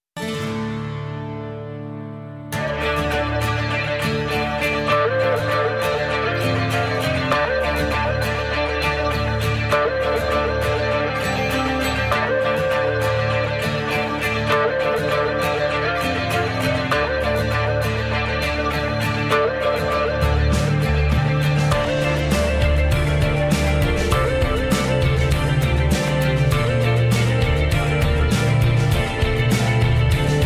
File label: Post Rock Guitar